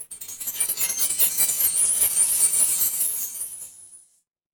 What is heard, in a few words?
magic scifi portal